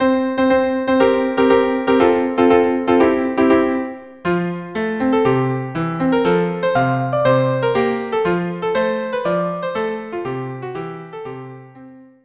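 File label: violin cello duo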